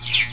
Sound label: Neutron beam; Starbound II